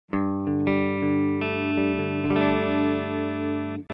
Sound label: Electro-Voice ND967 - Clean (Neck)